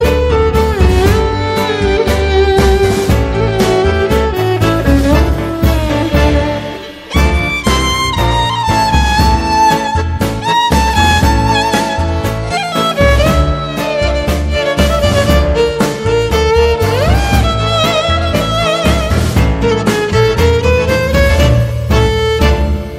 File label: classical tango song; ringtone